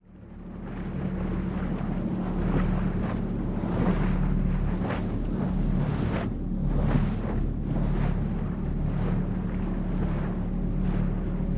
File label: Military PBR OB Water